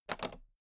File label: toolbox latch open regular